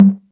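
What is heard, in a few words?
78 low tom, Roland MC-303 Toms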